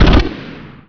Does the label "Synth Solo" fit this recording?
No